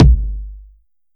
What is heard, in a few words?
rich mids bass drum sample g key